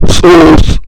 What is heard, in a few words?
sus sound; SussyCraft; amogus general